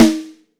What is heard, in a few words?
YAM, YAMAHA SNARE